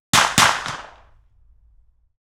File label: Vz61 Scorpion 9mm 50m behind clean Double shots; Ehrlund; Pole Position Production